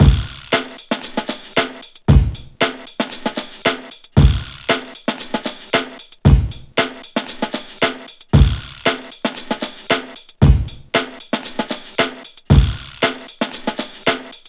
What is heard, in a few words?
115.2 Perky Cut Tamb, Breaks; Lockdown Breaks